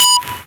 Harsh Sonar; Zero-G Sound Sense Grime Scene; 90 s Sample CDs

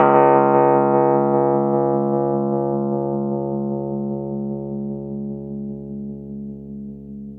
Rhodes 73 Slow, 3-D Audio; E-MU Producer Series Vol. 5 – 3-D Audio Collection; 3DRhodes

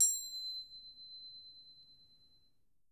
Percussion B4, Harp; Miroslav Vitous - Percussion & Harp

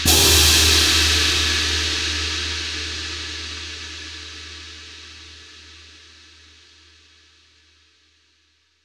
Crash, impact samples

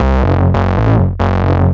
bass 138 f#, bass-licks, Techno; instrument-loops

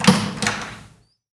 Village Hall Door, household rhythm samples hits

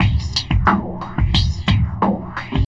Filter Loop, Drums & Percussion, Electronica

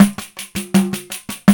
PAN, ETHNIC PERC; Roland - LA Composer vol. 1

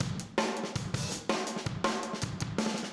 Room, Drums, 162 BPM; Verse

